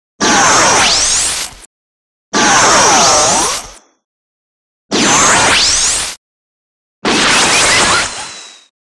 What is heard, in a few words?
Gun Laser Laser Beam; lasers